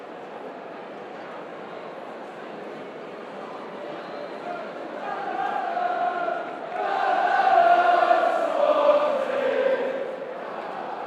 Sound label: swansea city fc nah nah nah swansea